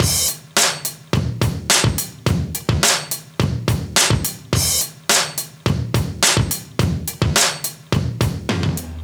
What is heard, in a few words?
106 live breaks